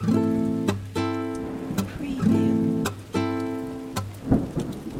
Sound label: Guitar 2-4 110BPM Am, nylon guitar; beatcraftpro